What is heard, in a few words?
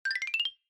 Xylophone Slow Short Up